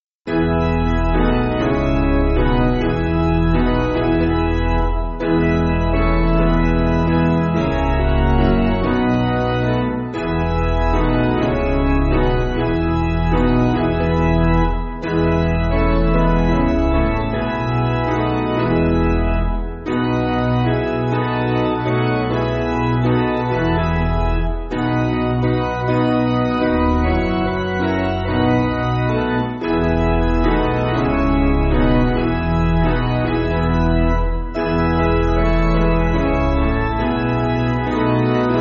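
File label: Piano, Music Backing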